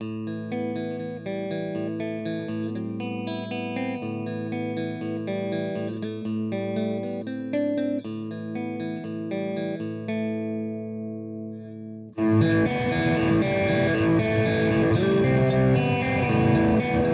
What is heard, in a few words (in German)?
Verzerrer Pedale FX Loop Revv G3